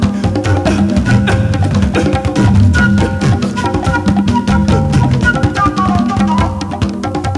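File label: rhythm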